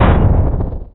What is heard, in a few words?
Quake; johnny upgrade